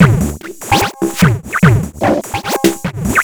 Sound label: Organic, Drums Beats